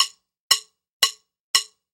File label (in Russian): zvuki shchelkunchika